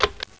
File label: lift button